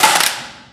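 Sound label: physics clipboard impact medium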